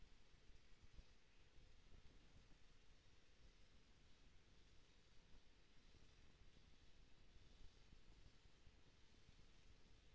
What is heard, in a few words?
HYDROPHONE 16000Hz; JASCO AMAR HYDROPHONE 740